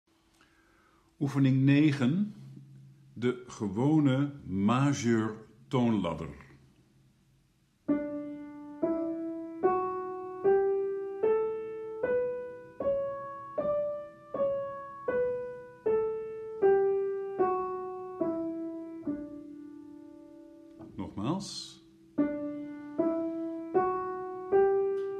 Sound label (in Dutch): Oefening Majeur toonladder